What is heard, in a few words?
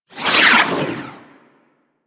Doom Sword Projectile By; Warframe; Excalibur